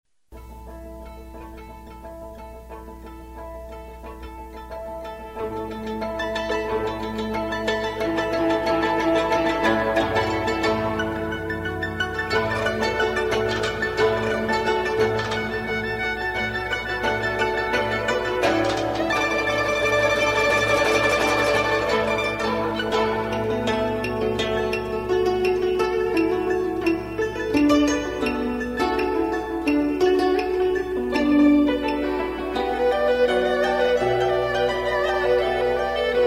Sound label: joyful evening, guzheng